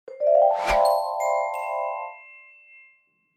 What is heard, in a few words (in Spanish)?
audio alarma